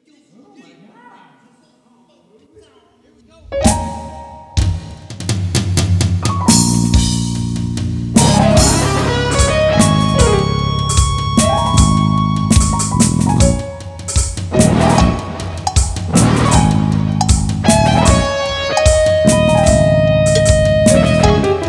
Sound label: Zombie Train